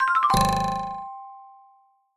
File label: chip fail